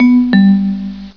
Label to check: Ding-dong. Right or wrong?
Right